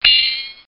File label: PICKKEY